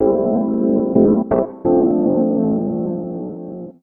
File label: SYNTH HOUSE 125 A, Keys; eJay